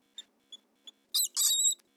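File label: Pachyuromys duprasi adult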